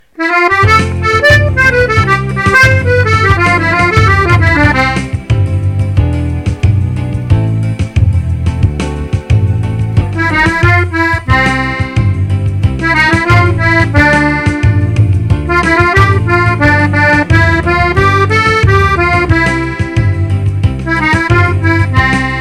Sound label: Cumbia